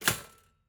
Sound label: Toaster Pop Up, Household